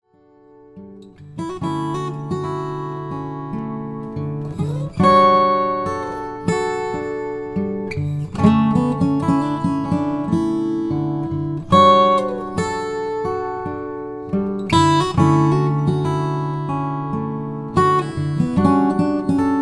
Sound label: guitars